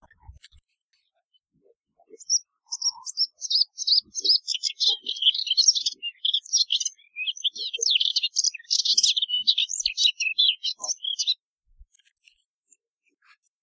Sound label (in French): hypolais polyglotte; Trille de type Itération rapide Glissando Gazouillis LOng conTinu; chant